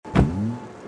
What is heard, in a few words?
comic sound - jump booster; it takes two